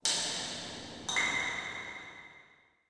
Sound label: PIPES, pipes; zones; Maxis XA Audio